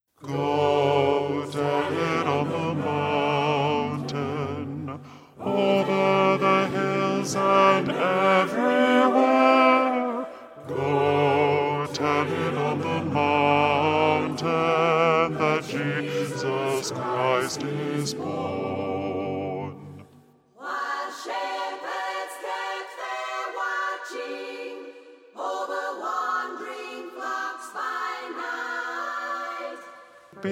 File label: go tell it baritone